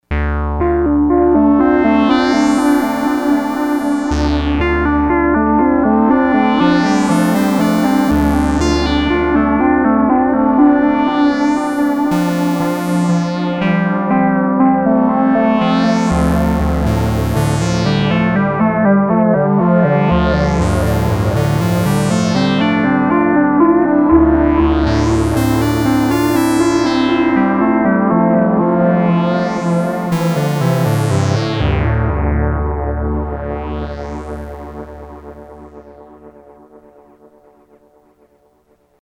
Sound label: med delay